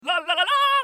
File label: Singing Sword Pickup; naughty bear panic in paradise; ps3 xbox 360 gamerip 2012